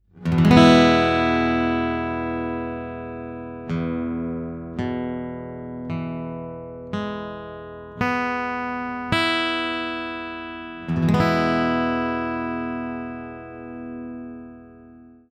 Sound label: E Major, guitar